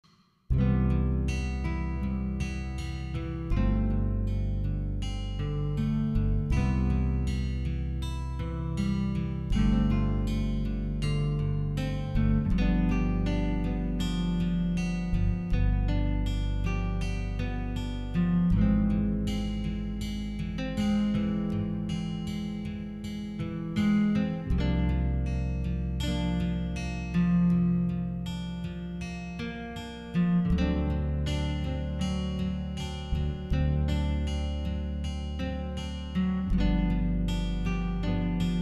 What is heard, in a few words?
streams rhythm track